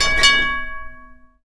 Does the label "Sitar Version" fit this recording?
No